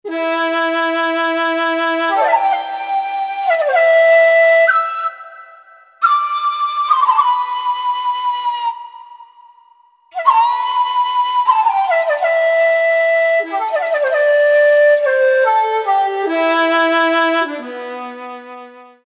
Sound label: Shakuhachi, Roland U-220; Factory Patches